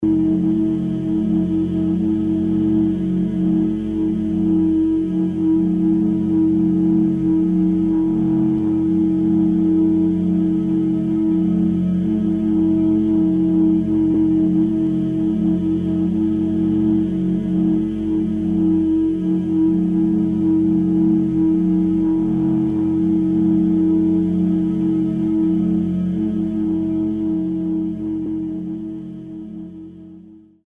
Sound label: Healing Jazz Swift; AudioSoul